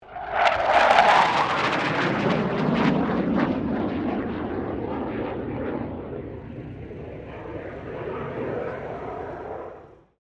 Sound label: 1997 BAE HAWK 127 PASS; Airplanes; Sound Effects:Vehicles